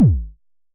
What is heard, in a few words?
Unce Unce Kick G#1, Vermona Kick Lancet; LookIMadeAThing Kicks